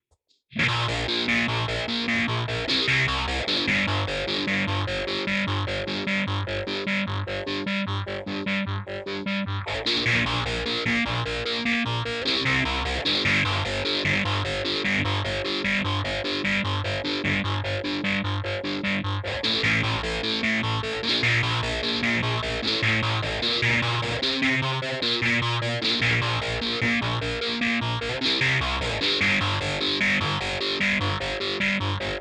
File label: Source Audio Ultrawave SA 250